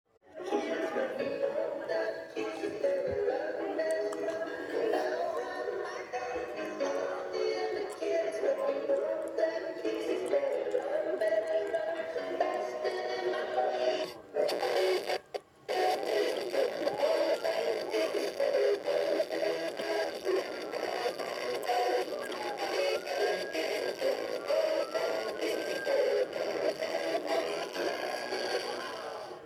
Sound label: bass drop